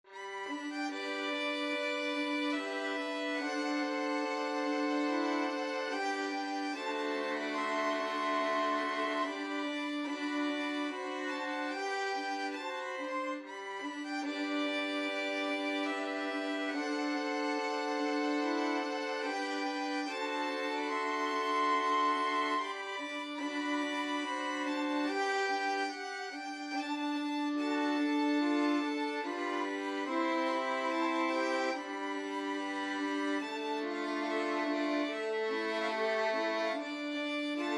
raindrop, violin ensemble

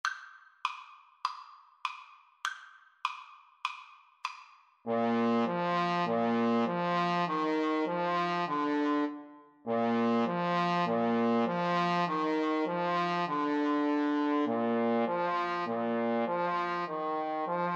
alouette duet, Trombone Duet

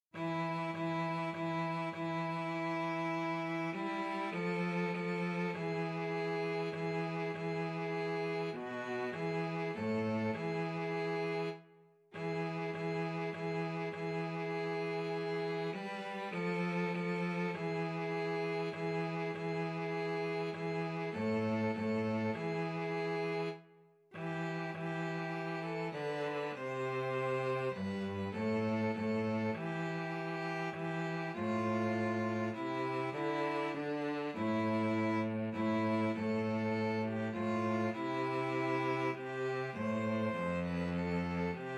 violin cello, violin cello duet; tallis theme

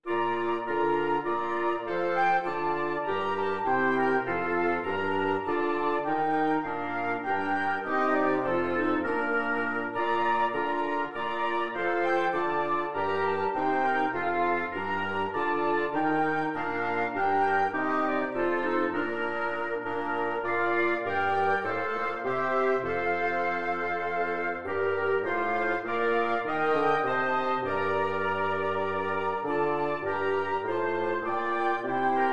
ein feste burg, wind quartet

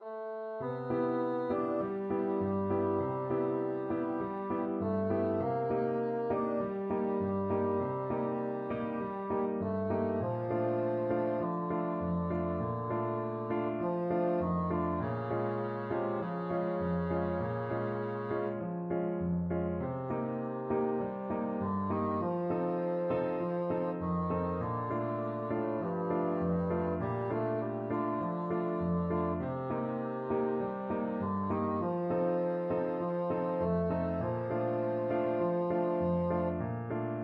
bassoon

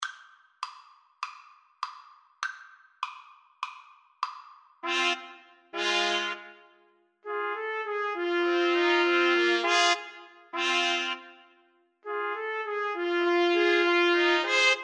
TPT, Trumpet Trio

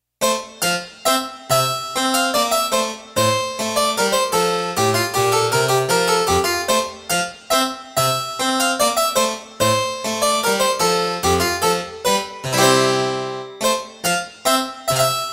gavotte in f major, piano

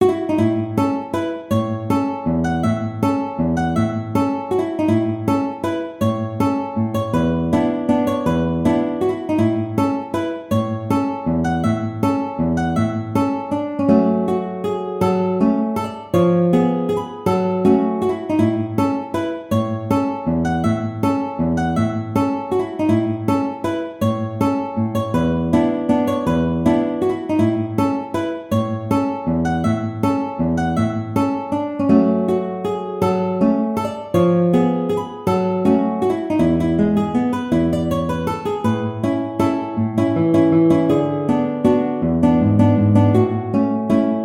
Waltz, guitar

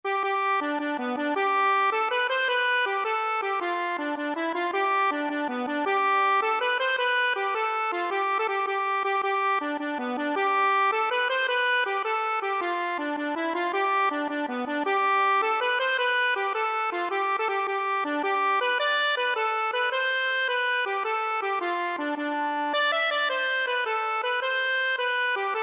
kitty magee, Irish Trad. for Accordion

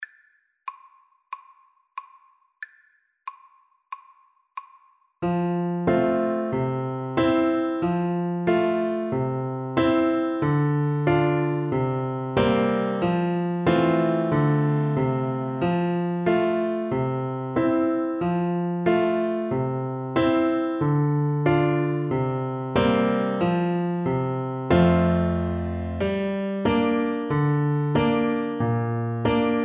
Tuba

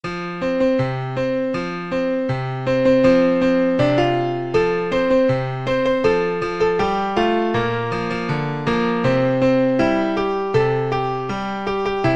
wabash, piano

